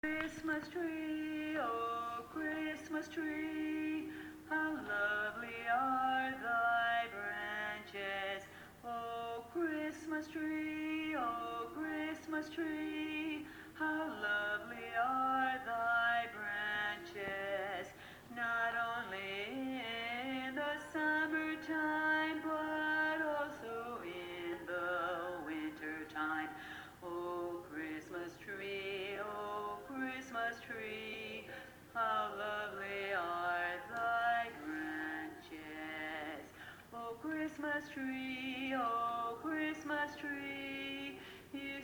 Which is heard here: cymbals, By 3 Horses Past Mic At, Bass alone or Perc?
Bass alone